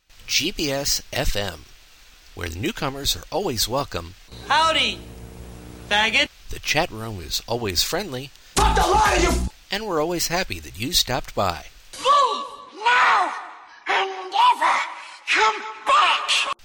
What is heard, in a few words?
jingle